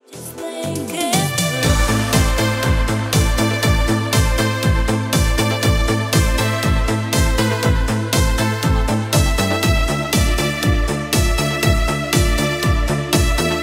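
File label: Ringtones for call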